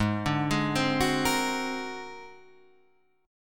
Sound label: G sharp 9th Flat 5th G sharp; G#9b5 arpeggiated; x,11,12,13,12,13,12,x; JGuitar